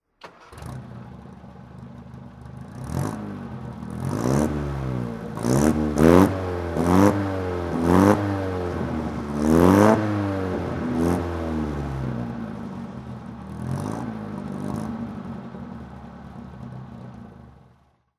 singer nine throttle, Singer Nine Sports; 1934; Engine sound; V3D/ ClassicarImages; Marc Vorgers

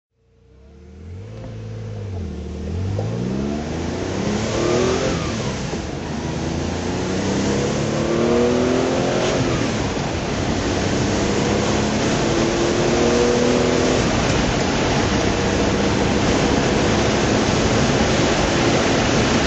porsche 911 24S targa drive

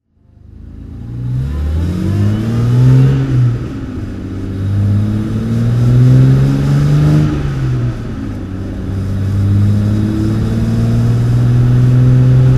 austin healey 3000 mk2a driving

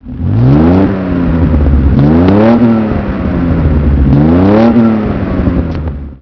healey mk3

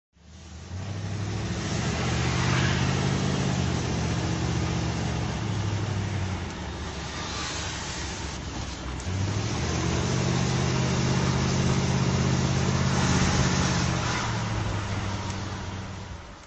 facel fv3 drive

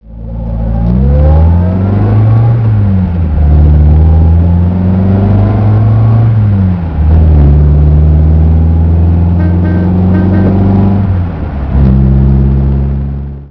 mga 1600

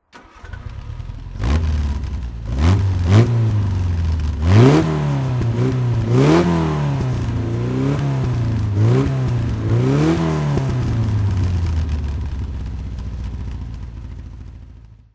lotus seven throttle; Lotus Seven series 2, 1964; Engine sound; V3D/ ClassicarImages; Marc Vorgers

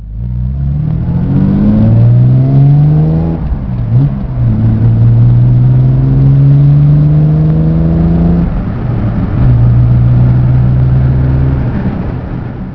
db6 inter

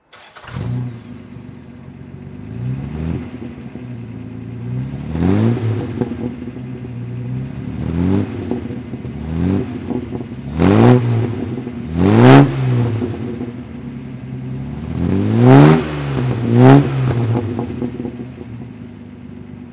healey 3000mk1 throttle

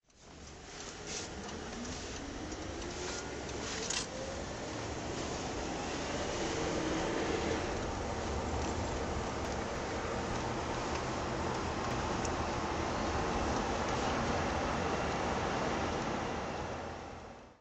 bentley s3 drive

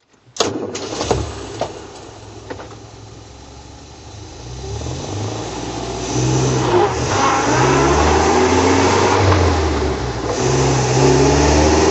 austin healey 100 4 bn1 drive

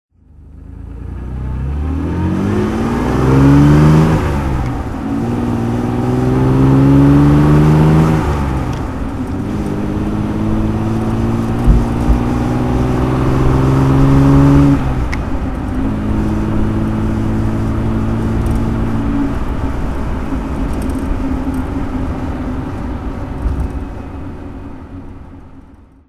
austin healey 3000 mk2 3 carb driving

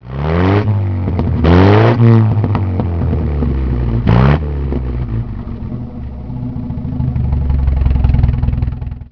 healey 100 bn1

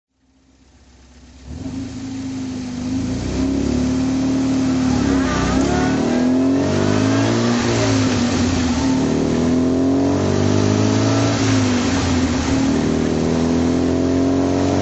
lemans drive; heal 1004 lemans drive

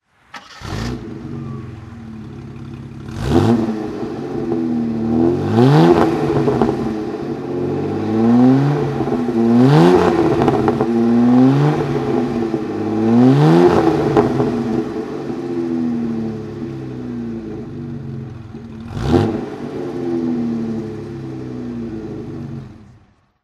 jaguar xj6 42coupe manual throttle

